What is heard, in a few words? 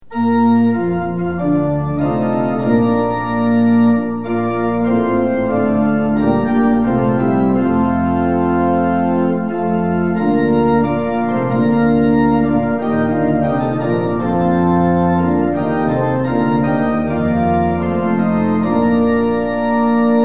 tune; hymns; lillenas